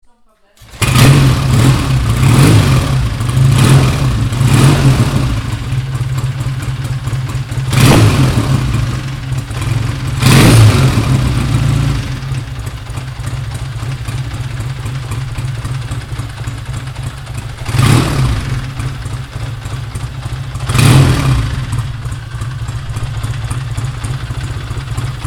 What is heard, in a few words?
royale enfield 500 classic bullet carburetor version